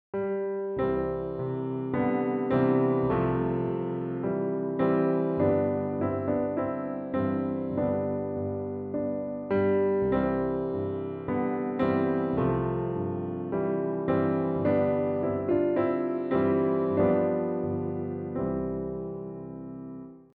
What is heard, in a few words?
brahms; Play entire phrase